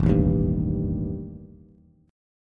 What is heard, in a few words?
E Minor Major 7th Double Flat 5th E Bass; strummed; JGuitar